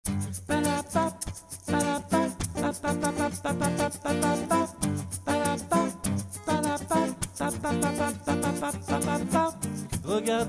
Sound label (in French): bidonville lead harmo, groupe vocal; Voix / lead Harmonisée